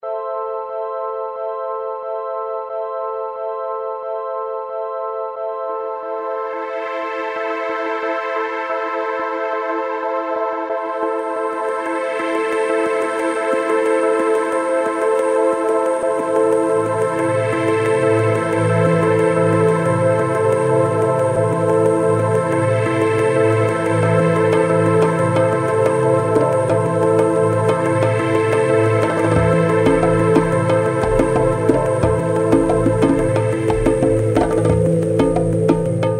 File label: mood music